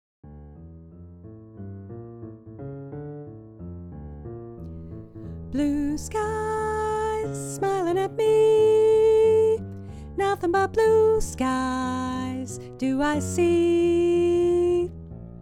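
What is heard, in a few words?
Soprano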